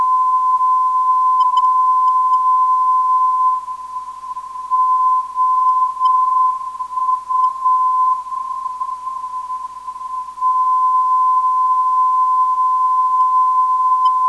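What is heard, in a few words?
non-directional beacon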